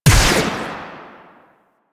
Igniter Pistol Shot; Warframe; Weapons; Corpus

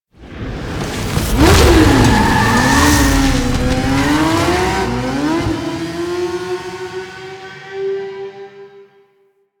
Motorcycle Extraction; Gameplay Cinematics; Vehicles

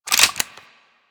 swarm rifle reload; Warframe; Infested; Shock Swarm Rifle

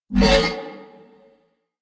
Ram Pain; Animals; DE; Warframe; Quests; Duviri

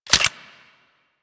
Hand Cannon Remove Clip, Reload; Warframe; Weapons

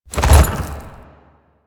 Horse Jump Heavy; Foley Actions; Warframe; Vehicles; Duviri

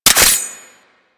Blade Open, Weapons; Warframe; Tenno; Soma Kama

